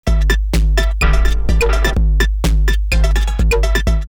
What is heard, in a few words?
Tones of Funk 126, drumloops, Rave; X-Static Goldmine; 90 s Sample CDs